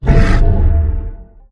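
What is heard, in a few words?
bear stun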